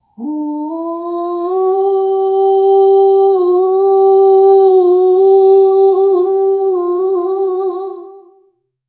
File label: low voice of a woman, chanting